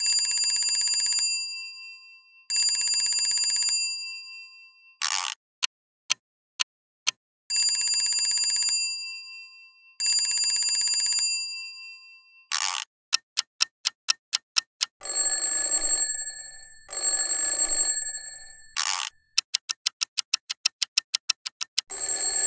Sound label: Schedule Clocks; LG K92 5G; ringtones